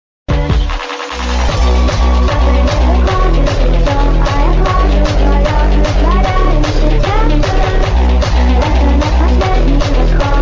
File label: hardcore